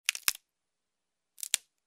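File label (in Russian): похрустывание отдельных веток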